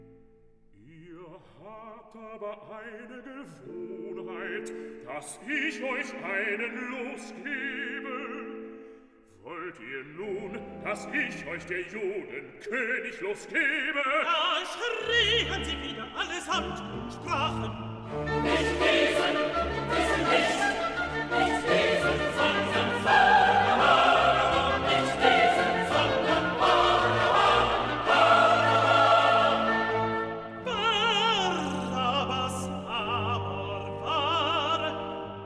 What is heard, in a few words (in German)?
TENOR, Stimmen mit Klavier; Johannes Passion